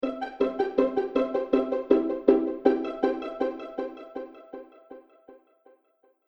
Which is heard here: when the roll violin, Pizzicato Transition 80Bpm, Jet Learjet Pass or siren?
Pizzicato Transition 80Bpm